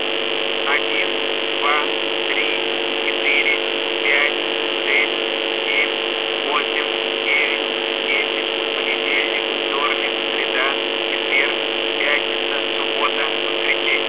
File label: buzz 50hz, TONAL NOISE